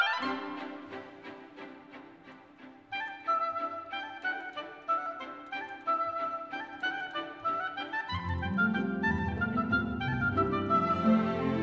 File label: Oboe Concerto, Allegro Giocoso; Strauss, Richard